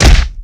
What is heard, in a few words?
Mega Face Punch, hand to hand combat; Sony Pictures Sound Effects Series